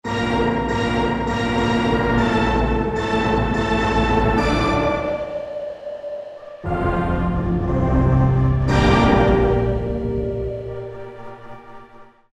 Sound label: Yamaha TG33